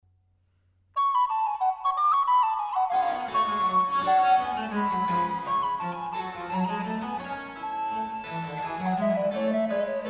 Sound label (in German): Altblockfloete und B. c. Fugue; Neufieme Suitte g moll